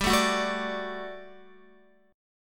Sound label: G Major 7th Suspended 2nd G down Guitar; strummed; 4F,4A,5C,5G,5C,6E